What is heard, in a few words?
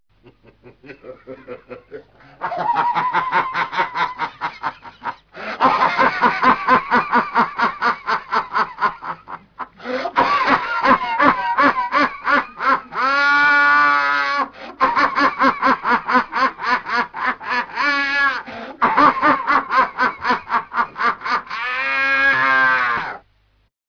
satanist laugh